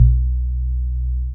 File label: Soft 303, baesse